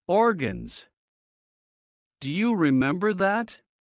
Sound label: Heart low; Classroom humanbody